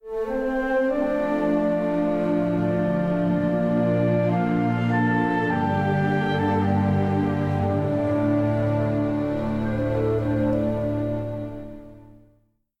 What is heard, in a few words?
ballout; Panda